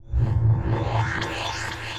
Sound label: Noise Sting; cinematic drama; Stings; musicradar